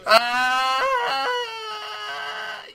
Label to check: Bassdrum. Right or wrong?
Wrong